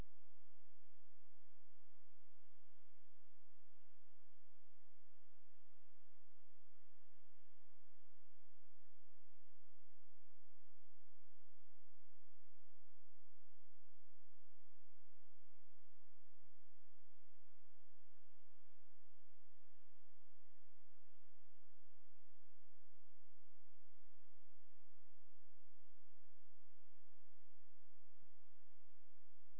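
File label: hydrophone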